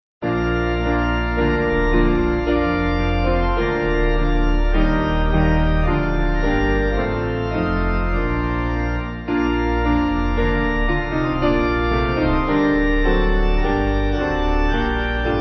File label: Piano Organ